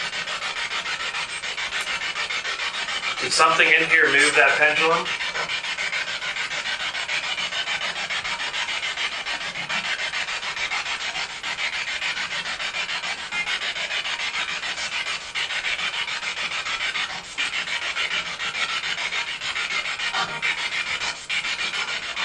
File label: Seance Room Spirit Box; wildwood sanitarium investigation